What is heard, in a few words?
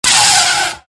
saber block; effets speciaux; Blocages